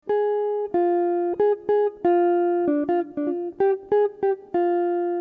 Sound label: blues